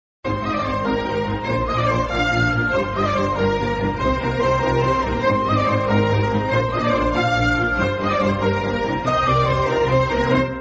climax bgm ringtone love sad emotional bgm ringtone; oye movie